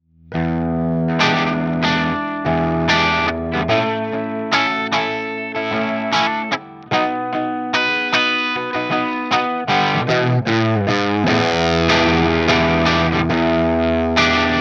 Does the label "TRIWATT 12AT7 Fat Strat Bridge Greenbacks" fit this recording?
Yes